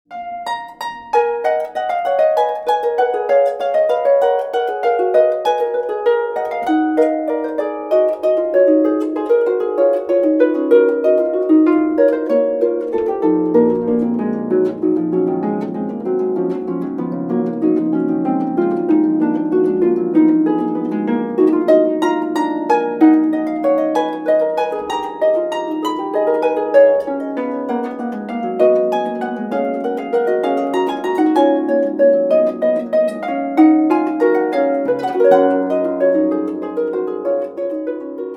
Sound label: Harp